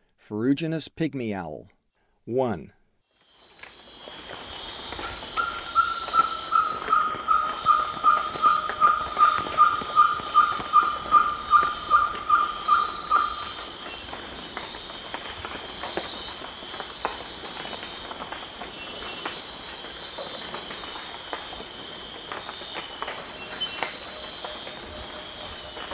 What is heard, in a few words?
Ferruginous Pygmy-Owl; S. America; Eastern Lowlands